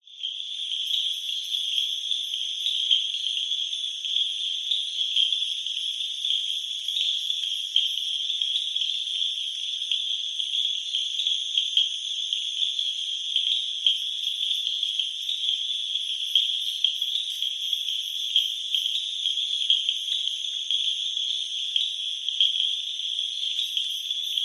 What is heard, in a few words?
insects fireflies birds frogs doi suthep national park thailand